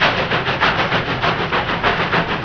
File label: chug chug; railsounds; trakworksaudio